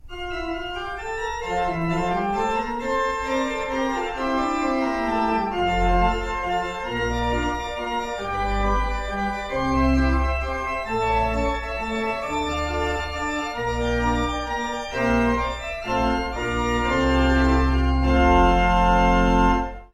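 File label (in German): Präludium Anfang; Bach; BWV556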